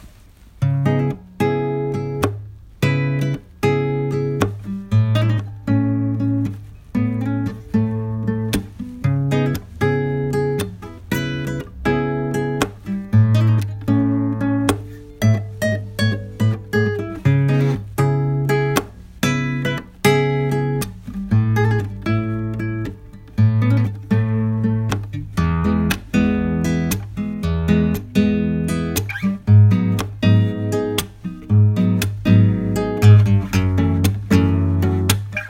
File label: Yamaha C70; Аудиозапись Yamaha C70-1